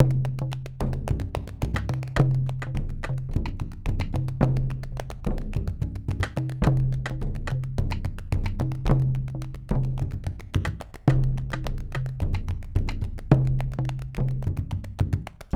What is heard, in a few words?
Drumhands Mix; War Cry (Cayovinto)